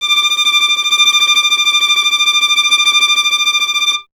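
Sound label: string violin tremolo; Miroslav Vitous; Solo Instruments VOL-2; Solo lnst. II; Vln Trem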